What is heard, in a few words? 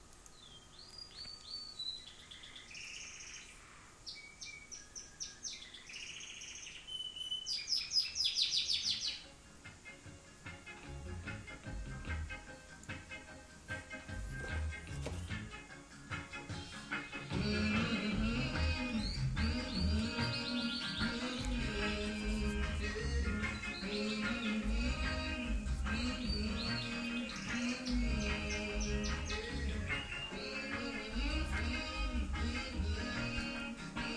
toots birds bongs